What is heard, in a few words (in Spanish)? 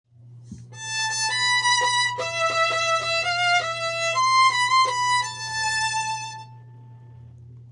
Extracto B normal, cumbia